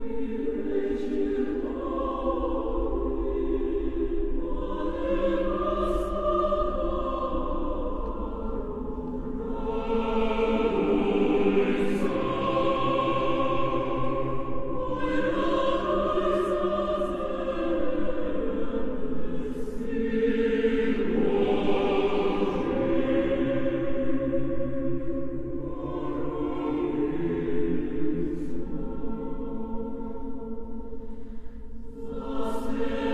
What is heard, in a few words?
Four Russian Christmas carols (1 & 3 sung in Ukrainian); Anonymous (Russian)